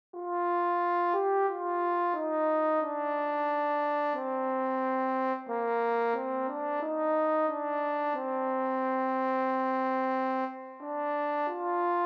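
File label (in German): solo Alle Jahre wieder Horn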